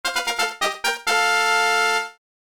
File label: Level Up; Dragon Quest III